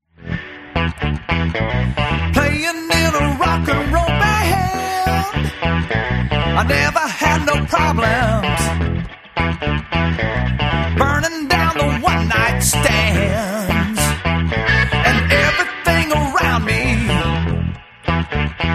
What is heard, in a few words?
minus drums